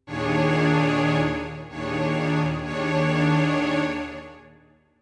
strings; Undead Overlord

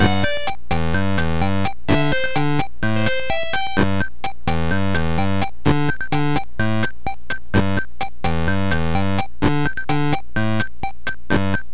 Game Boy Camera - Menu

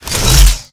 Pickups; unreal development kit

